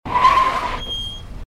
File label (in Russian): rezkij tormoz shiny i kolodki